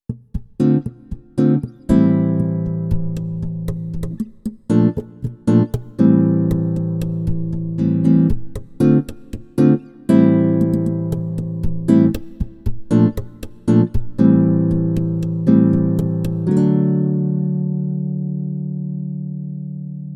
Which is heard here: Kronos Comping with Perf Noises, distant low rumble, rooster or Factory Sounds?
Kronos Comping with Perf Noises